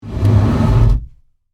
Wood Movement, Foley, Wood, Movement, Furniture, Chest; Machinery; BlastWave